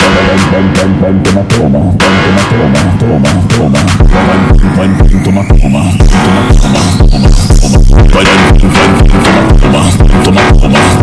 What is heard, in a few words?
Phonk Music; فانک phonk